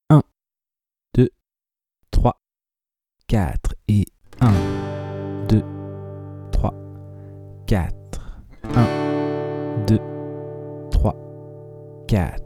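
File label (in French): lent Passer de G à D, suites d'accords; De 0 à vos premières suites d'accords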